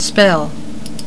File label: SPELL